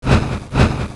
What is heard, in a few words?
Green Dragon Move Sound; Heroes3